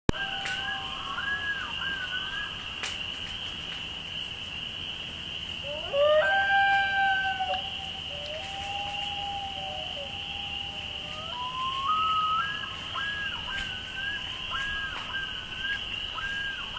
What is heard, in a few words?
Loon Peepers, nature